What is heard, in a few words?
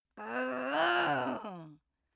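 female aggressive, screams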